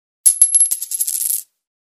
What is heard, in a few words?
Coin Ringtone